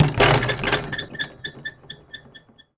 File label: Street Ball Glass Rim; SFX Kit; Street Basketball